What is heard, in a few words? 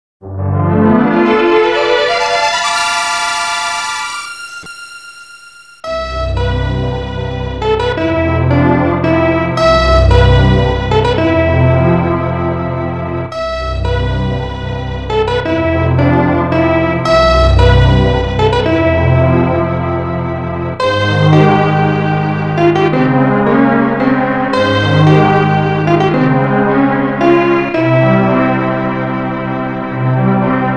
end music easy; zelda